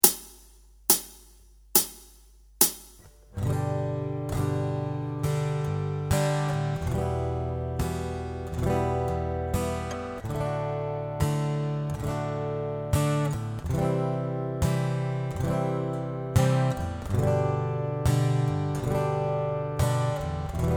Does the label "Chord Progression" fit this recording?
Yes